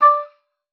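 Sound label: oboe d'amore D5; Xsample VOL-7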